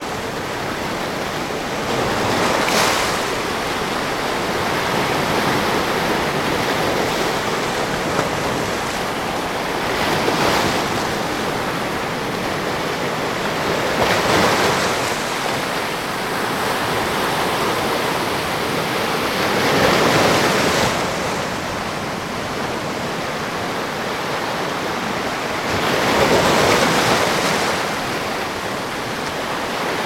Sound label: water ocean waves on rocks